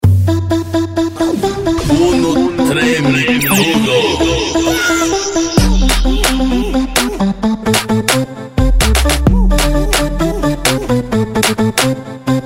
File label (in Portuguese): especial funk